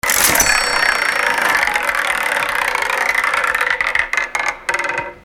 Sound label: wheel